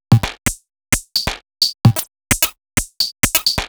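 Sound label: Odd Glitch, 130 BPM, Percussion; Sonic Foundry (Sony Creative Software); Underground UK House & Electro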